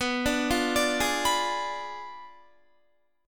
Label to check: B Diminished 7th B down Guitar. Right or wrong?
Right